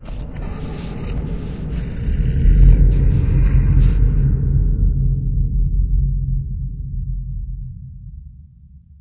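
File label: distant growl, caves; ambient, levels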